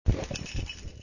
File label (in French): texture clés